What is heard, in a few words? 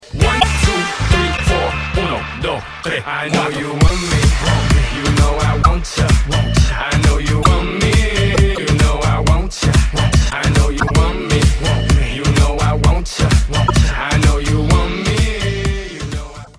rumba